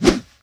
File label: dynamite throw; tf2